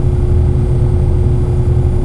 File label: generator, ambience; cairn